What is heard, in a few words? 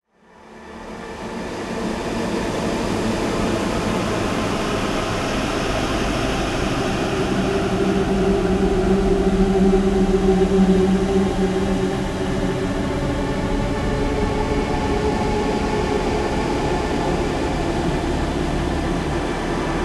Dark Ambience; hot lava; ios macos windows